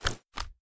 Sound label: reload empty fast end, sh; gmod; wfoly plr sh charlie725